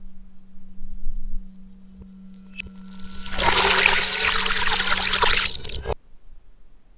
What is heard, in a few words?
Reverse Water Pouring Metal bowl